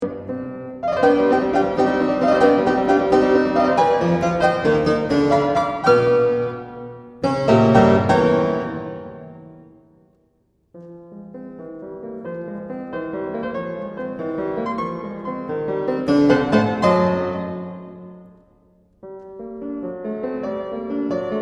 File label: Piano Sonata C minor